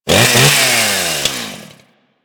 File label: Ghoul Sawman Rev, Attacks; Warframe; Grineer Ghouls